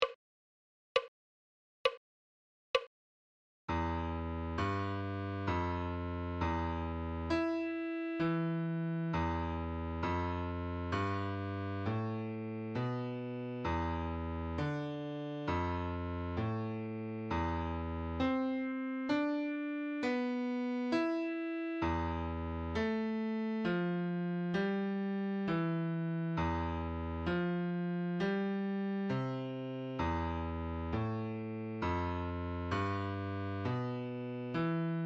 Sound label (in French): Clé de Fa Adagio